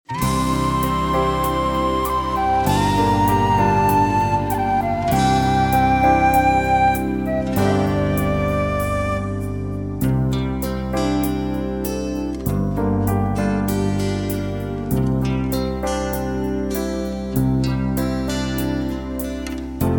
(C) no backing vocals

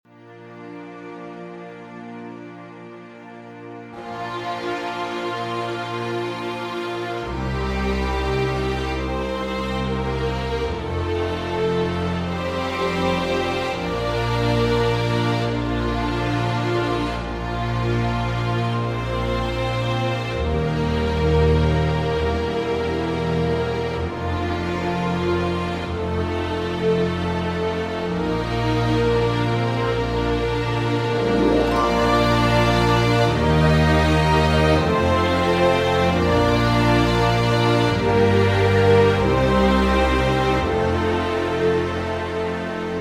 (Bb) No Backing Vocals

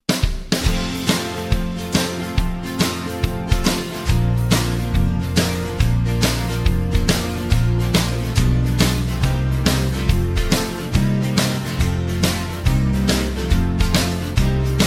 (Bb) No Backing Vocals

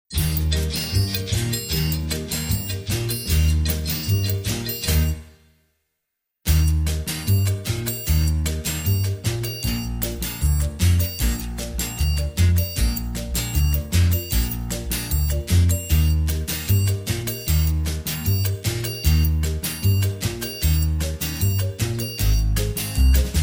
(Eb) No Backing Vocals

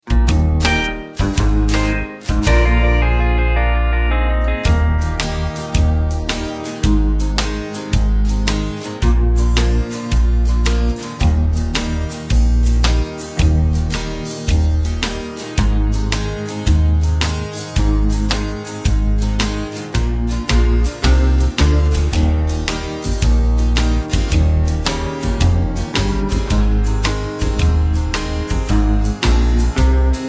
Eb No Backing Vocals

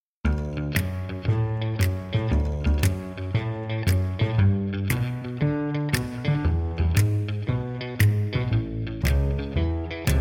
(D) No Backing Vocals

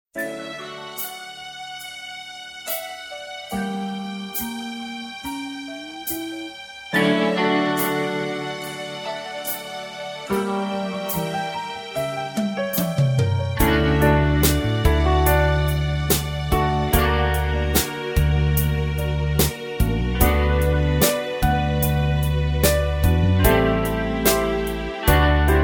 (D) No Backing Vocals

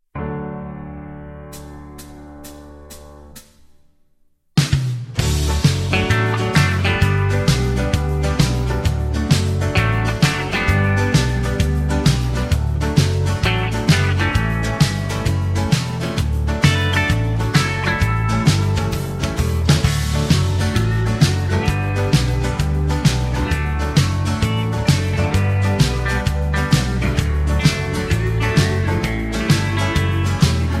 (D) no backing vocals

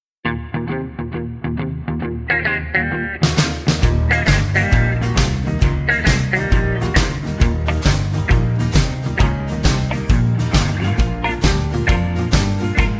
(Eb) No Backing Vocals

